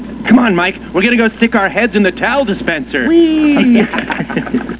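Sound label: towel dispenser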